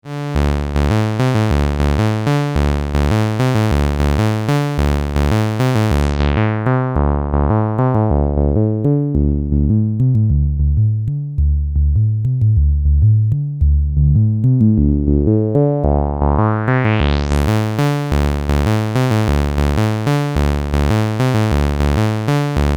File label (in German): Wellenform Saw mit Filter